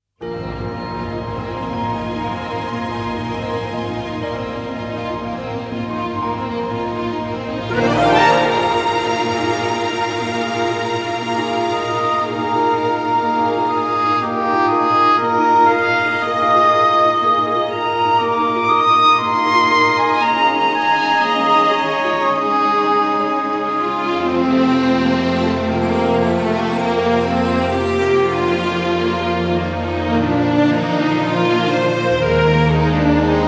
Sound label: winds and brass; Chris Hein